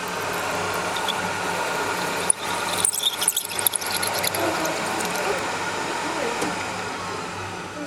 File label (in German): standerbohrmaschine